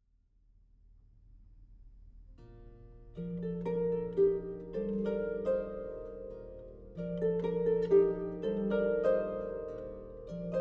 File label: Harpist; Flower Duet